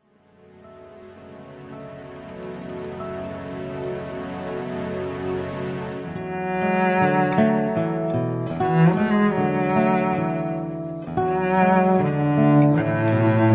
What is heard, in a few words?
cello gtr; AIGBURTH